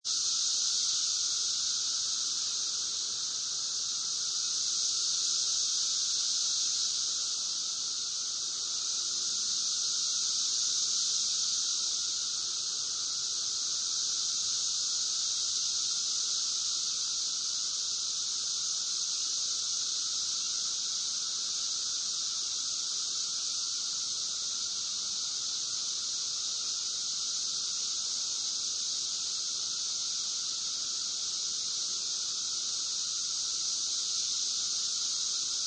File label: Cicadas, 17 year Cicadas; Insects; Animals